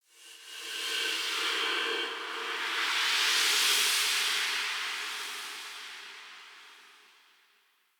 Spirit Fly, Ambience; Soul Plane; Orlick

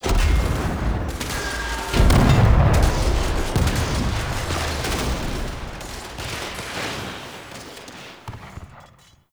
Ambient Battle Close; Warframe; Tau

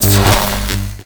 Void Dragon Ring Sparks; Enemies; Gameplay; Warframe; Duviri

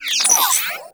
Digital Glitch; Ambience; Warframe; Corpus Railjack

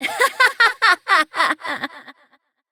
Laughter; Evil Twin C; Warframe; Entrati Doppelganger; Battle Barks

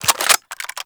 Reload, railgun; Lanka

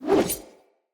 Dagger Whoosh Heavy, Swords; Weapons; Player; Josalfar

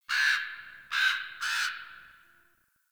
Tree Critters; Warframe; Duviri; Ambience

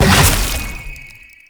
Laser Discs Proj Shot; Infested; Warframe; Infested Lich; DJ Rom

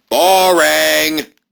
Barks Arcade Machine; Warframe; Mall

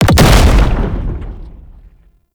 Rupture Cast Impact; Roathe; Warframe; Tau; Abilities

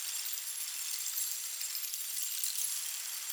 UI Map Hold Loop

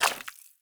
Pirate Footstep Default; Warframe

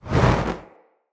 Player Torch Whoosh Fire; Weapons; Blunt

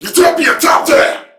Surprised Crewmen; Warframe; CorpusCrewmen; CrewmanB

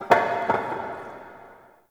Metal Debris; Warframe; The New War; Zariman

